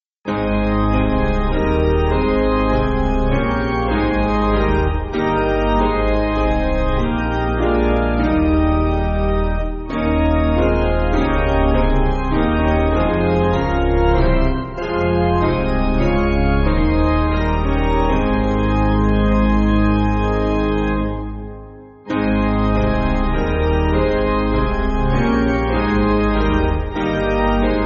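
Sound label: Piano, Backing Files for Churches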